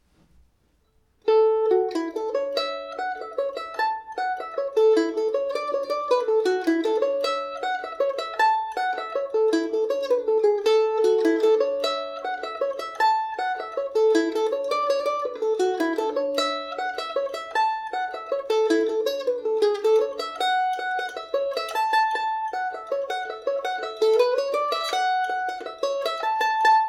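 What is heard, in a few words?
A major, Irish mandolin; biddy the bold wife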